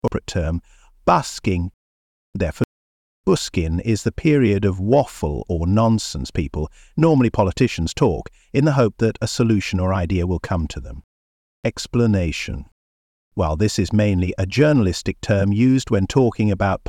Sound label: Busking; Corporate term